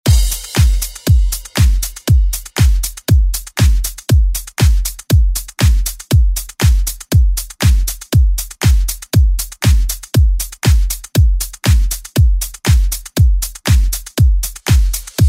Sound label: 80s Re Drum; DJ Mhark